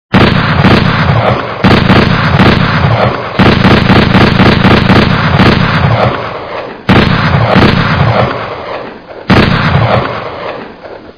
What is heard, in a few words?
2 guns